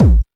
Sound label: Techno, EMU XL-7